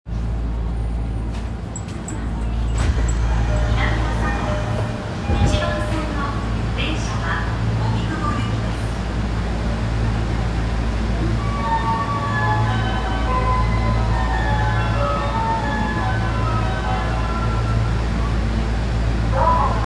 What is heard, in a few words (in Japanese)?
丸ノ内線南阿佐ヶ谷西, train sounds